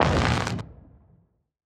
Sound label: Alien Coil